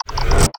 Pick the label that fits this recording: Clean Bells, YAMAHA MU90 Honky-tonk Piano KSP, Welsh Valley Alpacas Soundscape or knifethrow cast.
knifethrow cast